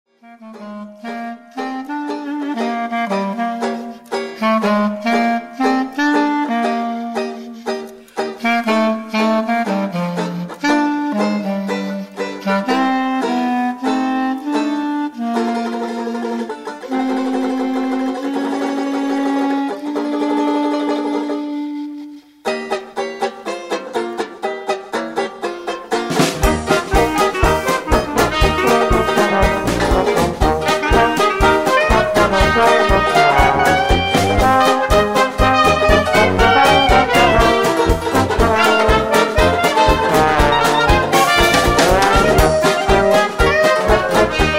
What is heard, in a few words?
dixieland jazz